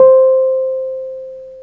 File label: PNO Wurly